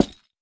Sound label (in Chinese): 悬挂告示牌破坏声